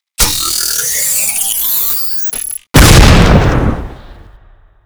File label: timer, tnt